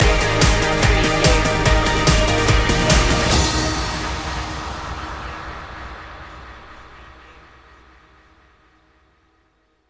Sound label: fresh energy stinger